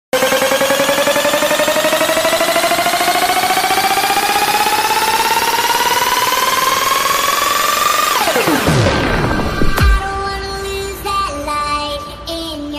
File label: heavy bass bgm ringtone